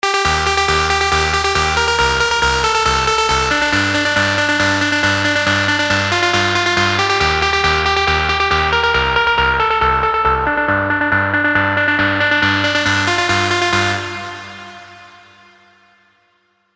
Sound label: Atmo Sphere, melodic leads; revealed diva melodic leads vol 2